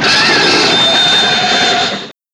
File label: break